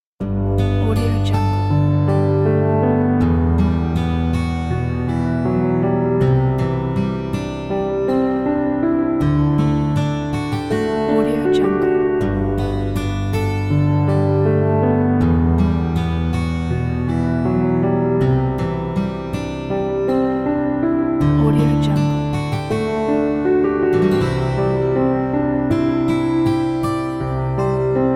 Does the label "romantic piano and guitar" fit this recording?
Yes